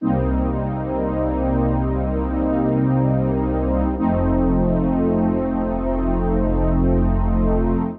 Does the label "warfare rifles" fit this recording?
No